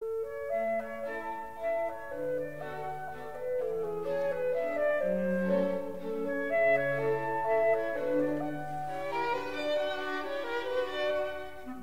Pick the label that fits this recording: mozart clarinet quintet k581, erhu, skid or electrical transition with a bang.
mozart clarinet quintet k581